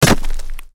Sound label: pickaxe hit